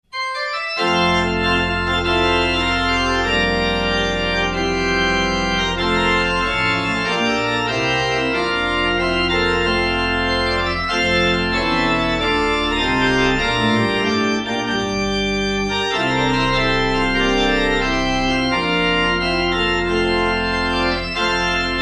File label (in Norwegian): Brudemarsj fra Seljord